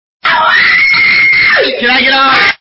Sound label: fat kid screamer